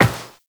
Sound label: Step Metal, Footsteps, Walk; Warframe; Characters; Tenno